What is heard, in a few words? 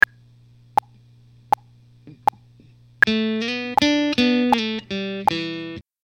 legato slide; beginner techniques; guitar